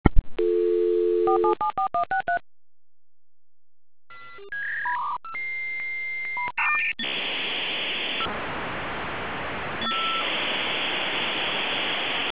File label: modem